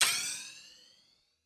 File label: elemental pole hit, puzzle; CrossCode